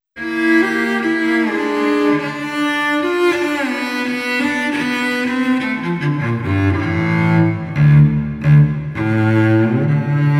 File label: Cello Arco PLATE; breverb